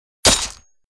kill; freak fortress 2; jason

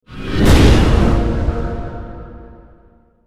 coil enemy; cstrike; war3source; avernus

